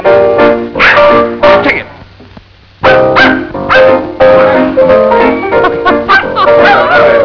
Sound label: Piano; awful truth